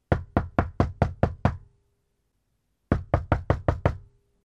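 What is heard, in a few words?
knuckles on the front door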